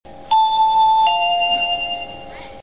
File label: chime